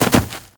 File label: Grass Jump